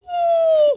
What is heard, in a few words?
whimper